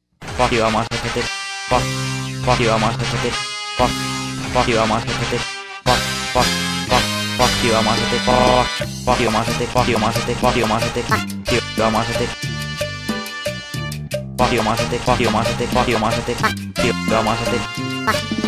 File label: applause; osu skins; Amasetic4.0; gnahus